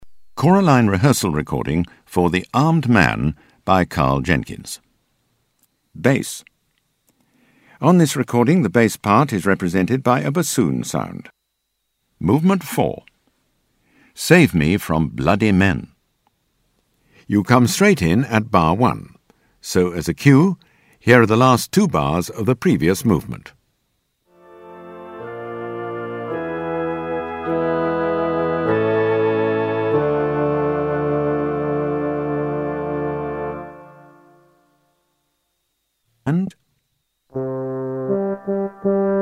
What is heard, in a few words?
Bass